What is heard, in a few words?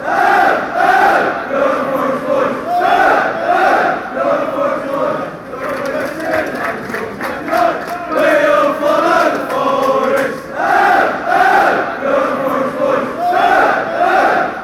fanchants